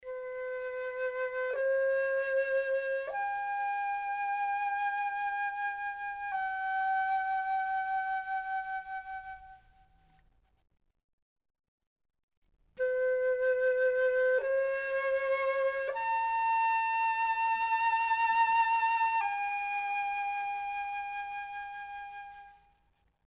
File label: Flute